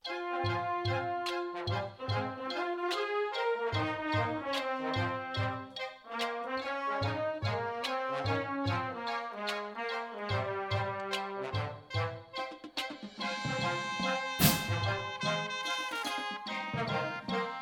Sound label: cymbals with music, drumline